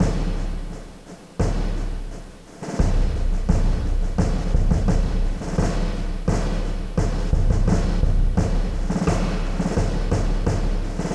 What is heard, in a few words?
drum